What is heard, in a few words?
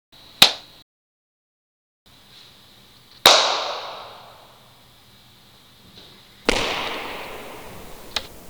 3 different claps